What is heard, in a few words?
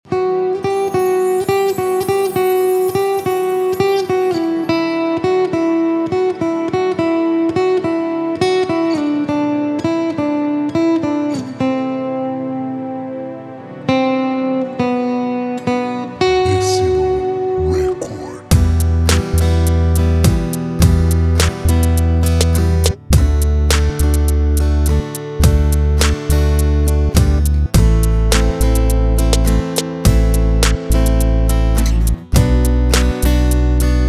Pop